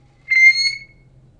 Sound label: scorpion squeak